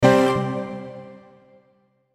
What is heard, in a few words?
Hit Stab Piano Pad; Hit Stab Piano Harp Pad; Blastwave